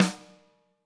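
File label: snare drum, Drums; Bob Clearmountain Drums I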